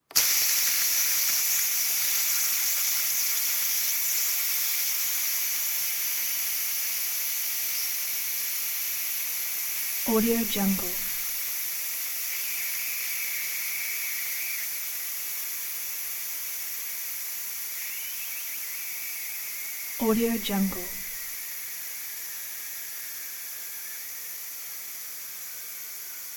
industrial steam release pressure cooker fade long full stop